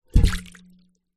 household water in large metal pot down on soft ground; zapsplat